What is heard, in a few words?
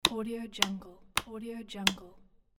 light switch